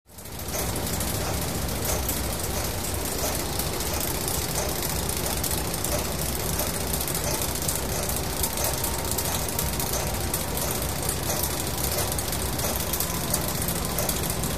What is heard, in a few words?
Factory Machine Clanking And Steady, machine drone